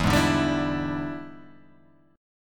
E 7th Sharp 9th Guitar Open G, chord

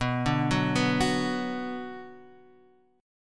B Minor B Guitar Half Step Down

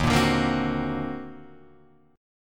Eb Minor Major 13th, Guitar, DADGAD; EbmM13; strummed; JGuitar

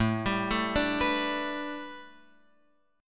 A 9th Suspended 4th, down, Guitar, Open F; A9sus4 arpeggiated

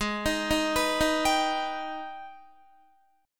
Ab Minor Major 7th, down, Guitar; arpeggiated; AbmM7

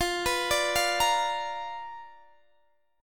B Minor Major 7th Flat 5th, chord

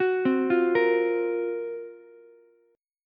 F sharp Major 7th Flat 5th, Ukulele; arpeggiated; JGuitar

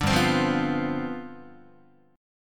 G 11th, Guitar; strummed; 5,3,3,3,3,4; 4A,4E,5A,5D,5Gsharp,6Csharp

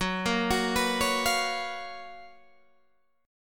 F sharp Major 11th F sharp, Guitar Standard; arpeggiated; JGuitar

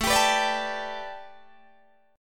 A 9th Suspended 4th A, Guitar DADGAD

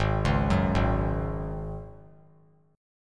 Ab 7th Suspended 4th Ab down; Ab7sus4 arpeggiated

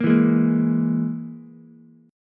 E Major Flat 5th, Ukulele; strummed; JGuitar

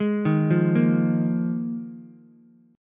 Bb 7th Suspended 4th Ukulele, chord; arpeggiated; JGuitar

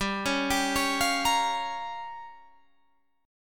G Minor 7th Flat 5th down Guitar Standard, chord; Gm7b5 arpeggiated; JGuitar

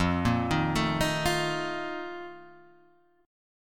Bb Major 7th Suspended 4th, F, chord

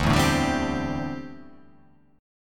D 7th Suspended 2nd Suspended 4th D

